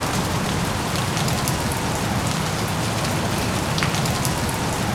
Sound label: RAIN